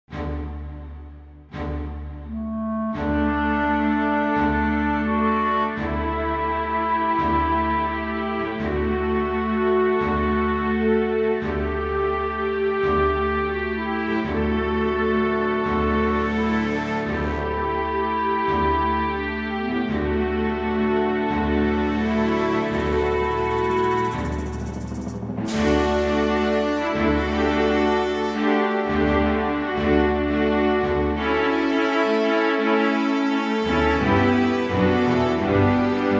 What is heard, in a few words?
Majectic Light; Filmtv-tracks